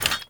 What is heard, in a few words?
Footstep Default; Warframe; Warframes; Hoplite